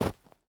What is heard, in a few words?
snow land; teardown; ps5 windows xbox series xs